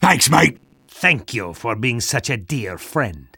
duo win

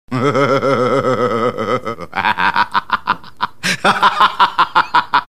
haha; hl2dm

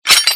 beartrap deploy; traps

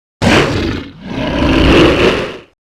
stun; tera creatures; alpine predator

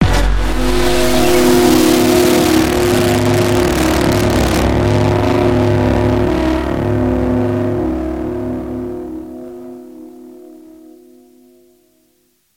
pong

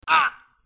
hurt

